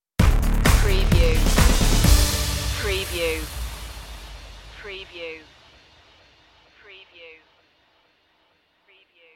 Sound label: well meet again stinger